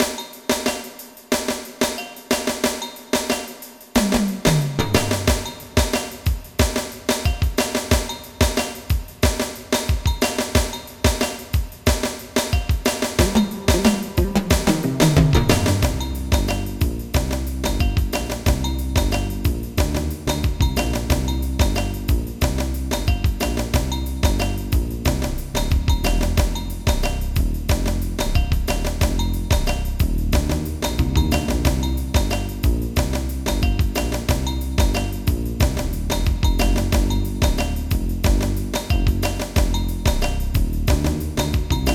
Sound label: DRUM; 501 Great Games - Volume One; Guildhall Leisure Services